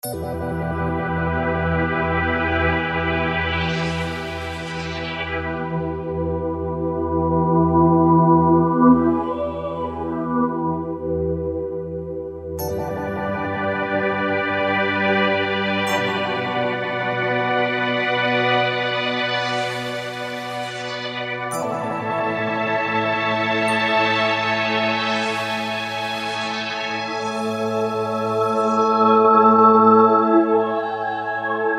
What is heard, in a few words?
Stereo Sweeps, Kurzweil K2000; Kurzweil K2000 V3